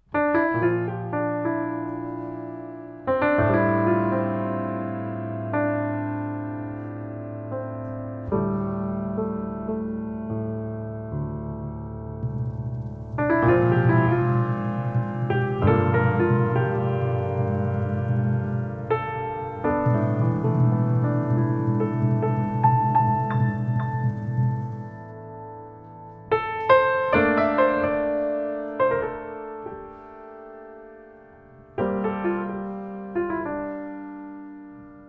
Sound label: Piano Interlude Sample